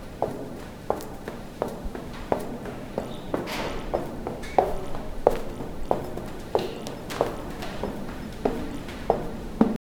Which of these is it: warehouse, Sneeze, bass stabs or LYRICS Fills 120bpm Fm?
warehouse